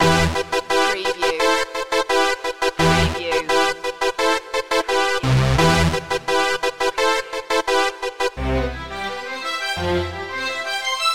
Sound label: hip parade loop